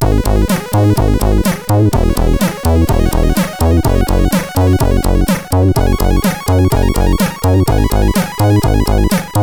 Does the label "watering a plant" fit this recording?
No